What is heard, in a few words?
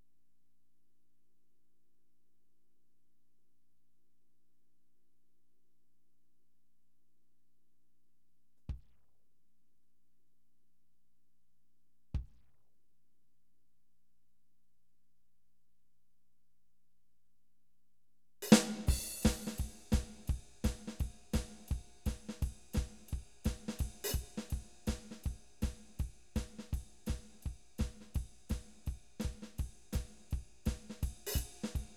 background jazz